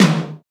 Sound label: TOM, KIT Real-Kit; 90 s Sample CDs; Sound & Vision - Gigapack I CD 1 (Roland); Real-Kit s10